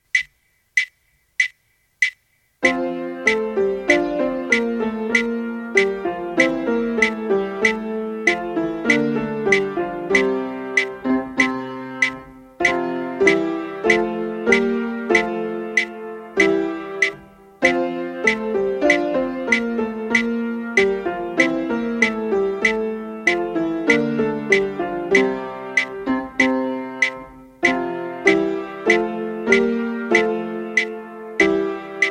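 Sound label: Refrain All Parts, Choir Practice Tracks; Angels We Have Heard on High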